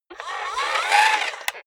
robot movement fast

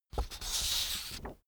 sheet take

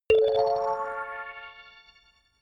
notification kalimba magic

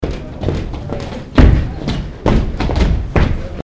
dance group

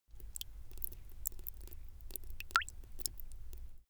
drip

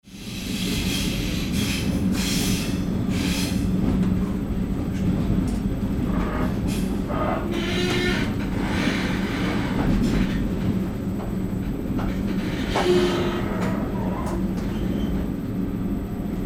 regional train slow creaking cabin inside

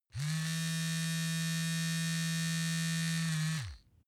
razor smooth

